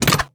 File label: Attack Crossbow; Game Essentials